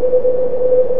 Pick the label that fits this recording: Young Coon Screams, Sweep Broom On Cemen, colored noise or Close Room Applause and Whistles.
colored noise